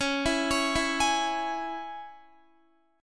Db Minor, Guitar; arpeggiated

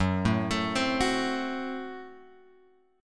F sharp Diminished; F#dim Chord {2 0 X 2 1 2} arpeggiated

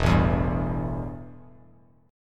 E Minor Double Flat 5th E; strummed; JGuitar

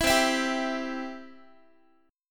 C Major, Guitar; strummed; 4A,4E,5A,5D,5G#,6C#; 19,20,19,17,x,x

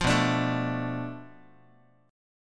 B Minor 7th Flat 5th, Guitar; Bm7b5; strummed; JGuitar

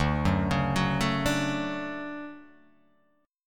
D Major 7th, chord

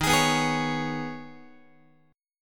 D sharp 7th Suspended 4th Sharp 5th, Guitar; strummed; x,11,11,9,12,12; 4A,4E,5A,5D,5G#,6C#; D#7sus4#5; JGuitar

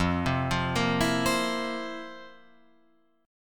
F Major 7th Suspended 4th, Guitar, Open F; arpeggiated

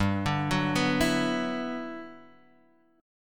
G Major 13th, Guitar Half Step Down

